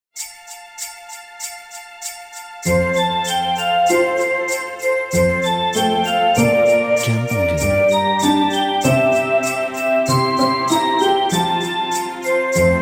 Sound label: Jingle Bells Classic Christmas